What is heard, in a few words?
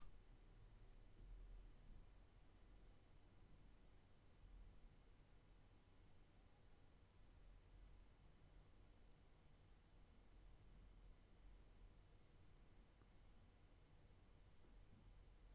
BG NOISE; ISK AT100